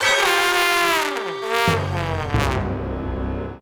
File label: Dies; The Act; Arcade, iOS, MacOS, Mobile